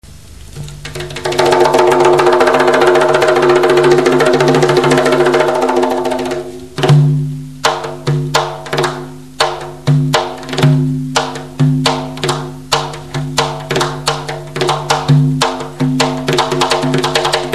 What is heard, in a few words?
gaval